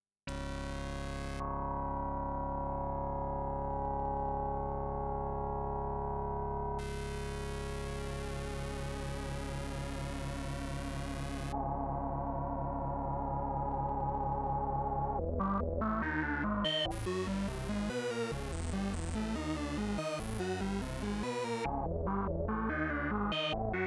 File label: synth square waterfall